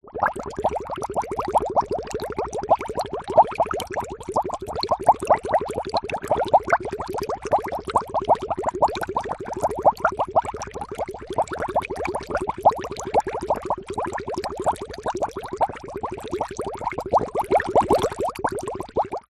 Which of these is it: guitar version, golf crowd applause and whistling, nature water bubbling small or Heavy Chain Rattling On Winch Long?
nature water bubbling small